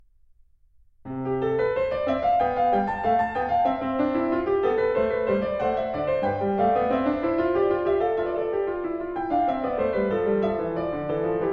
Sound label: Sinfonia in C-dur; Bach; BWV 787